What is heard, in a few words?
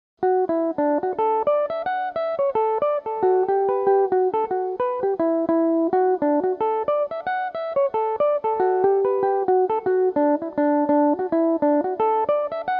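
jig, D major, mandolin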